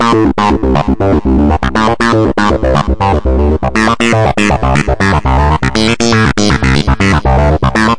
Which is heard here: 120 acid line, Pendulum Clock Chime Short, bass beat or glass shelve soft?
120 acid line